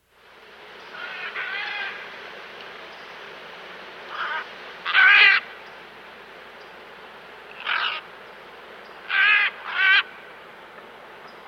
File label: Great Green Macaw; S. America